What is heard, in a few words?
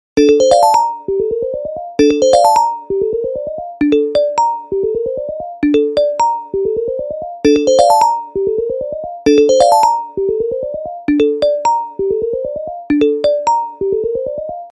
acer ringo